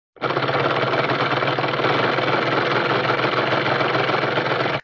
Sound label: Comedy Motor